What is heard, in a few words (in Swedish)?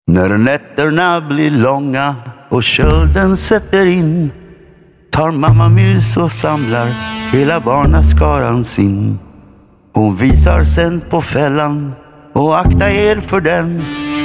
Jul i moll